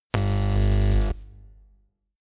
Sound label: buzz